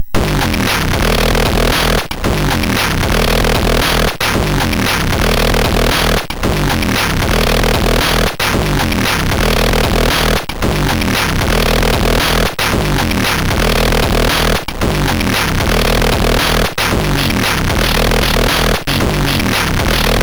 Remix sid MOS6581R2

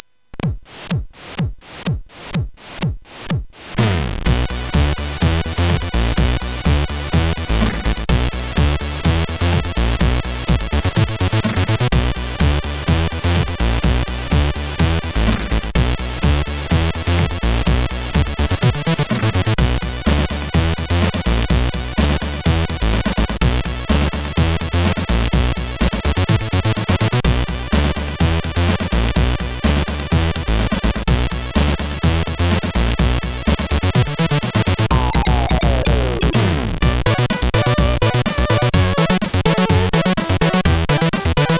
FUNK ACID, CSG8580R5

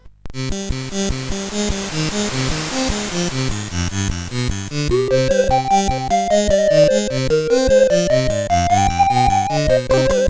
God Bless Thee Merry Gentlemen sid CSG8580R5; Twoflower

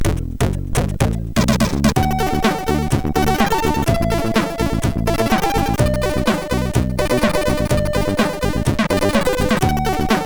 sid MOS6581; MOS6581R4

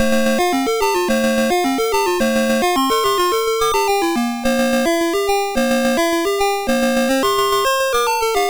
Quari, MOS6581R2

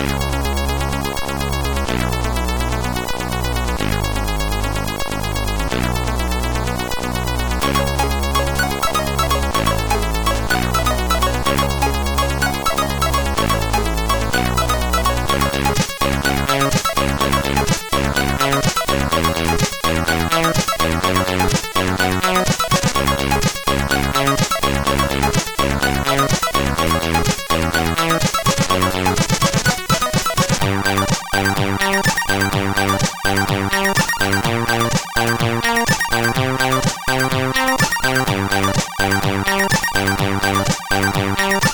CSG8580; Lander

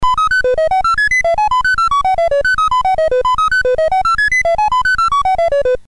Music Game BASIC, sid MOS6581R4; hvsc

